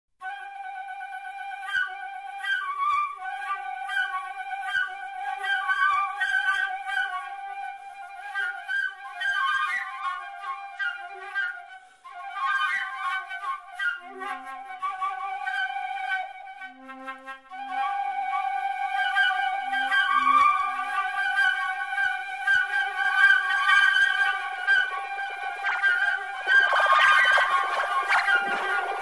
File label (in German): triller